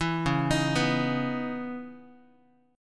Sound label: C sharp Minor 9th Guitar; arpeggiated; 7,9,6,9,x,x; 4A,4E,5A,5D,5G#,6C#; JGuitar